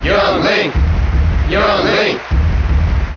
Cheer JP; Young Link; Melee

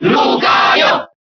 Cheer Spanish; Lucario; SSBB

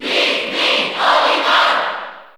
Cheer Italian; Olimar; SSB4; SSBU

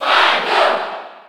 Cheer French PAL; SSB4

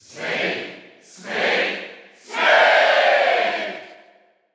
Cheer German; Snake; SSBU

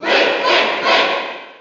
Cheer; Link; SSB4

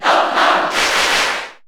Cheer Japanese; Mega Man; SSB4; SSBU

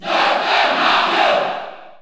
Cheer French; Dr. Mario; SSBU

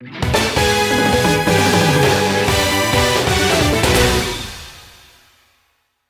Victory!; Street Fighter

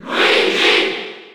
Cheer French; Luigi; SSB4

